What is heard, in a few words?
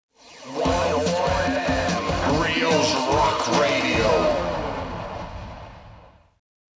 Radio Car Rock Jingle